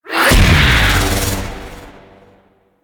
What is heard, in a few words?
Explosion Impact; Warframe; Warframes; Khora